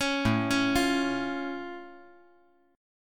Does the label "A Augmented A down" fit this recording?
Yes